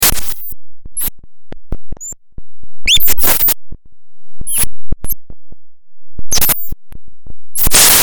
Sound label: fm7 patches